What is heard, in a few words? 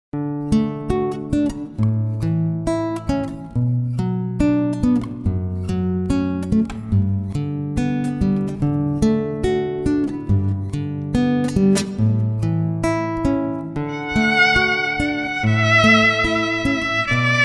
Violin Guitar; Acoustic Duo; Canon Patchebel